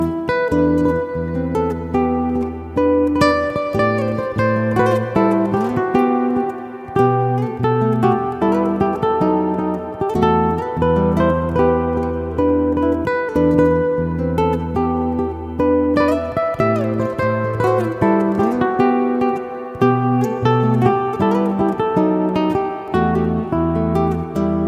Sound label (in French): Guitare SOLO